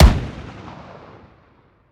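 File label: weapon ar fire player atmo exterior; gmod; viper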